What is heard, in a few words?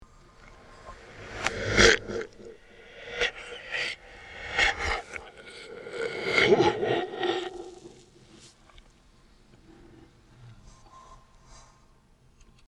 choking